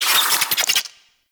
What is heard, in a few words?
Infested Ashen Vocal Pain; Warframe